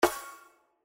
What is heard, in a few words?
Bonus Click; slots; District Samurai